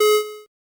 loc track beep; firefight

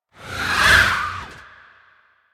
Whoosh Scream By Passing Ghostly; Hollywood Edge; sound designer's toolkit v2

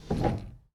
Foosball Forward Spin

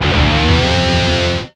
Pickup Guitar Riff; Pickups

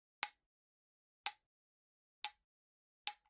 Generic Click Glass Tiny; boom library; The Interface

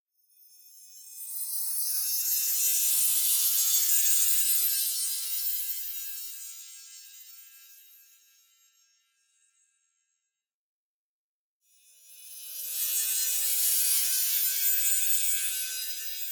SHIMMER Ascending Chimes Sparkle Freq Shift; Anime Essentials

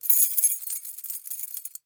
Key Movement, Automobiles; 1001 Sound Effects

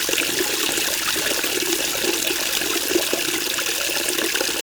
water tap flowing; best service Blue Box; water fx

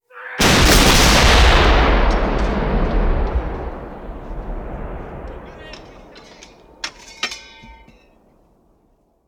Artillery Cannon Artillery; Sound Ideas; Guns

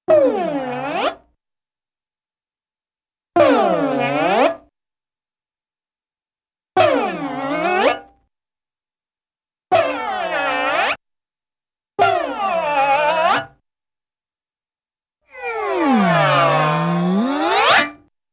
Musical Stretch 'bewwwhip' Sound; Cartoon; Hollywood Edge; Cartoon Trax

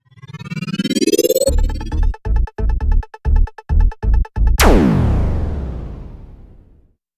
Radio Station ID

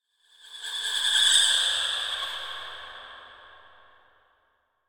Female Shrill Scream Pan By; Hollywood Edge; sound designer's toolkit v2

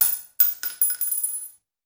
Bullet Shell; Sound Ideas; Guns

